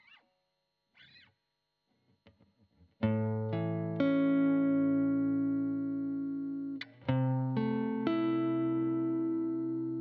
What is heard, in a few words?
GTR Royer